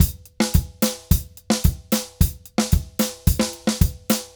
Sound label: Drums Murga 110 bpm; Best Service; Festa Latina VOL-1